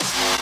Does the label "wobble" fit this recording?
Yes